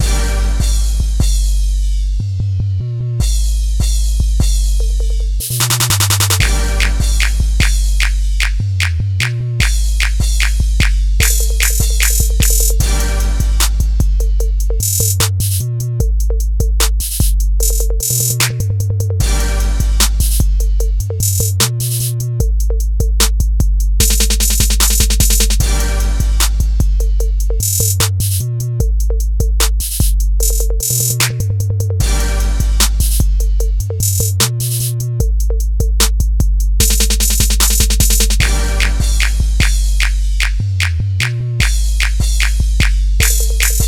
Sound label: Trap 808 Kit; MVP; Luv Kit